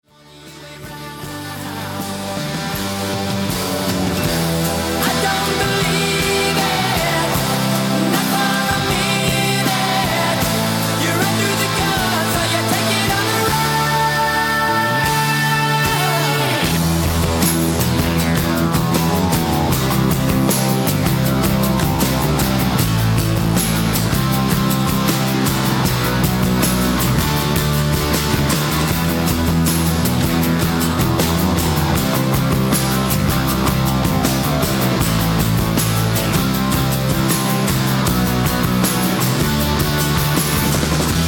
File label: Backing